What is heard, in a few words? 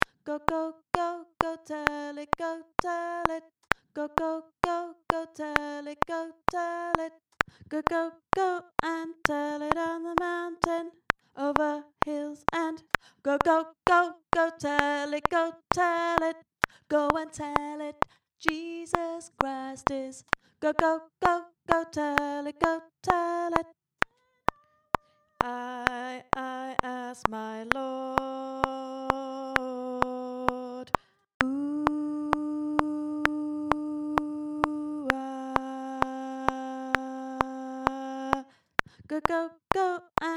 Alto; Go Tell It